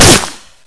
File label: cement, debris